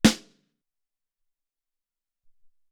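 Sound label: Tite Snare 3, Alesis SR-18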